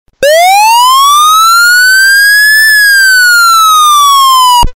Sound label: Manual Wail